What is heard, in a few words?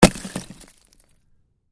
Ice chunk heavy, Ice: large chunk of ice lands on hard surface; Snow; Stuart Duffield